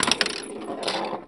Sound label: Pinball Ball Hit Rail Roll